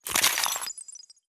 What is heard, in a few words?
Freeze; Going Under